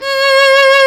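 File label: STRINGS SOLO, Chamber Grp; Roland L-CD702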